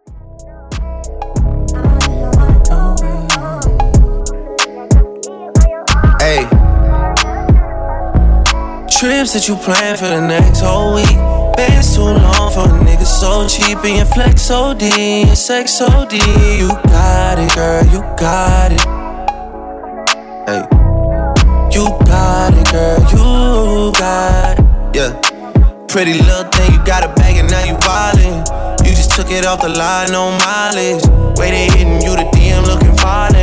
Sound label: RNB